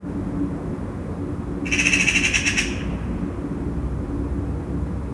egyet cserreg, szarka; allathangok; termeszetben; angyalfold

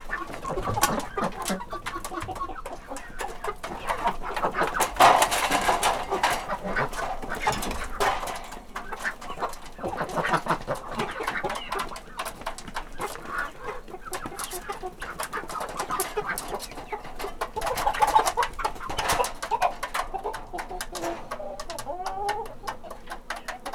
baromfi lemeztálca kacsa; parasztudvar

